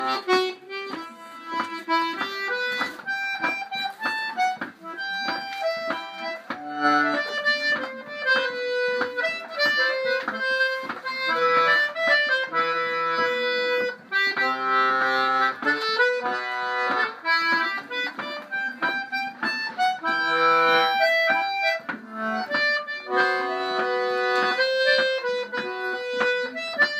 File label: Mazurka; La Paire De Saulxures